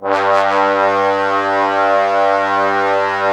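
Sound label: brass trombones G, Woodwind Brass Ensemble; 90 s Sample CDs; Miroslav Vitous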